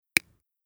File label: scale